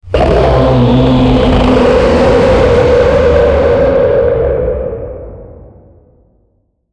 a low and deep scream, human scream

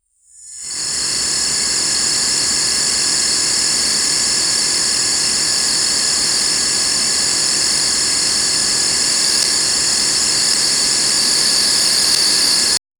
a sharp crisp hiss follow; chirp

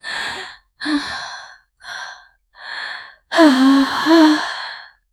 female inhales slowly; breath

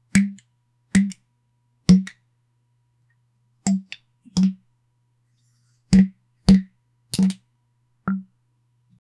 pickup a soda can with

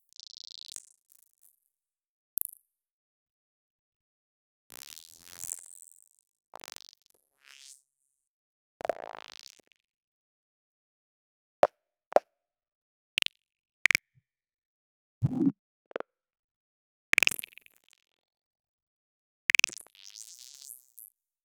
anime magic sounds sparkl

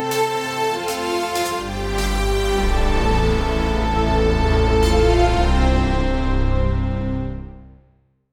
electronic videogame vict, game over

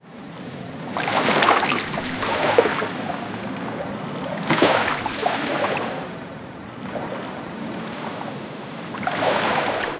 realistic water, coastal

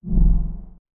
ui sound for the move; mythology

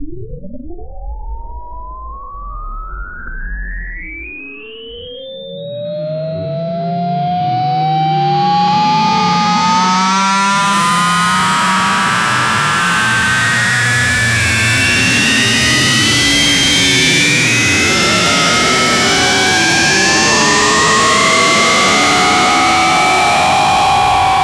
a fantasy mana charging; beefy

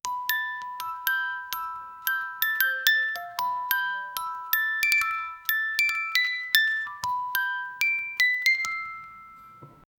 delicate ballerina music, toy

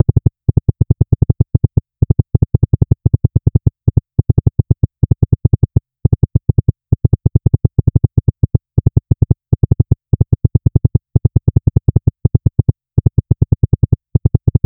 a soft rhythmic clicking, haptics

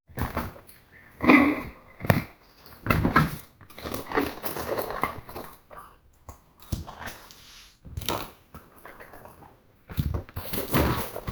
construction

